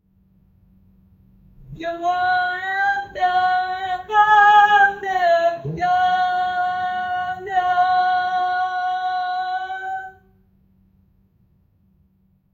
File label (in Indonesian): suara langkah kaki di